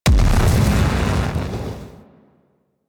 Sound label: Explosion Large; Warframe; Weapons; Thanotech; Thanotech Explosions